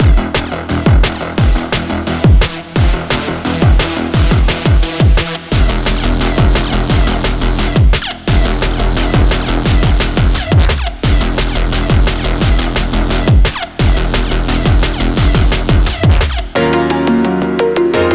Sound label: Liquid and Hard DnB